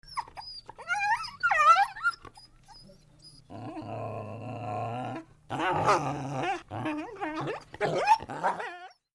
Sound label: Coyote Whimpering Cu